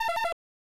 Unused Jingle; pro mahjong kiwame; snes

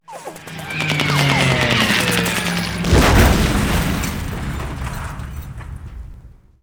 Atk Air to air; Gary Grigsby's War in the East

